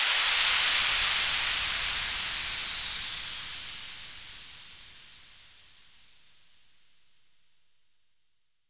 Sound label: White Noise Down; Cymatics